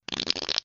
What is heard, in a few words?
Fart